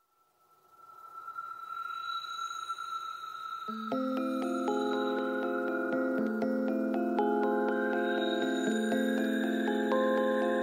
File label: curio music